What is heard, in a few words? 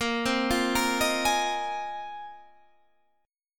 Bb 7th Suspended 2nd Suspended 4th Bb, Guitar Standard; Bb7sus2sus4; arpeggiated; 18,15,15,15,16,16; JGuitar